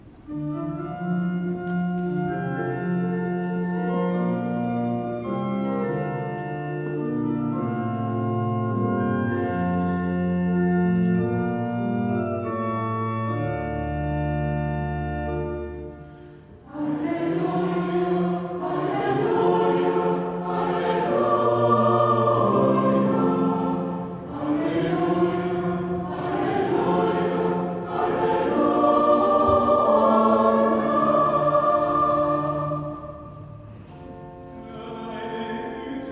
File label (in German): Zwischengesang, chor; Herz-Jesu-Sonntag 2025